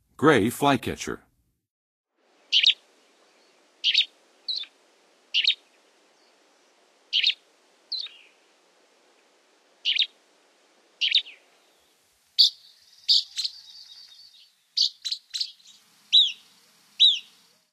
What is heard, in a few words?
Gray Flycatcher; North America; Western USA; Western USA Songbirds